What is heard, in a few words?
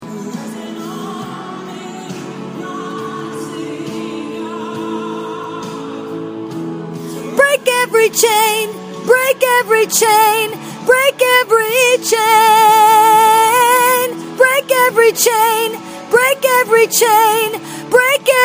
break lead